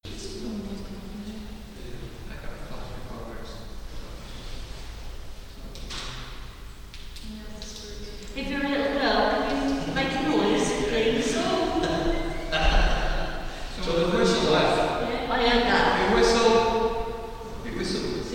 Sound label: ZOOM whistle